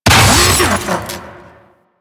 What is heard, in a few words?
Security Camera Alarm Destroy; Ambience; Warframe; Grineer Fortress